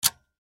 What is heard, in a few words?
Guitar Plug, Guitar Plug 1/4 In Instrument Jack Out Amplifier; Musical; Blastwave